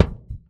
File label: Heartbeat Loop, Kicks; Airwindows